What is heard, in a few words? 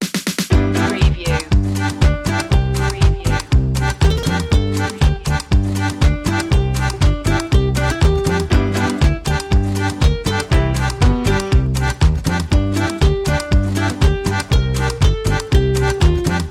fun in the sun no vocals loop intro